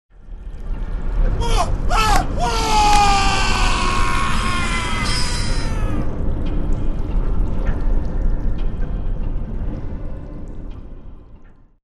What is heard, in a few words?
Washroom Scene; SAW